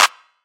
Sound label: CLAP